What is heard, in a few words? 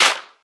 original clap D key; single hits one shots